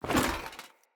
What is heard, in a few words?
Foley Action Deora Armour Melee Heavy Swipe; Player